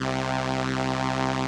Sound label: SOLINA, String Machines